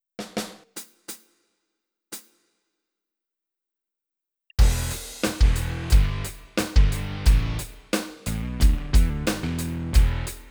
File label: Yamaha Rock2 training